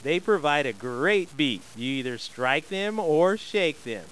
PERCU

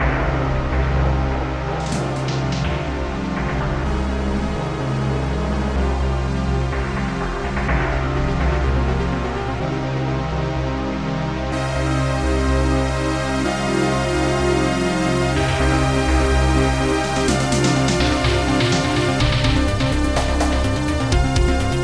music; PC/CD Gamer UK 16; Epic Megagames MASI Module

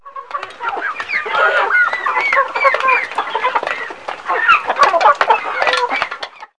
Chicken Ambience; Farm Life

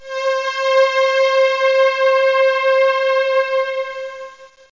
jx strings; Zoom - Release 2; Active Software

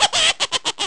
cackle; ARCADE; Z-FORCE

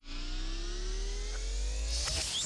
slide; oz worlds

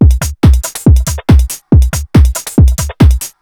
hifi D, Techno Drums; TechnoMusicMaker

